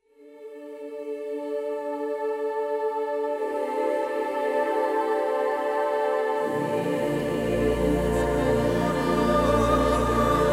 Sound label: Female Version Karaoke